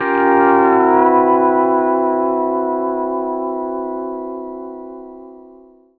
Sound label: PEDSTL CHORD, Country; D6 9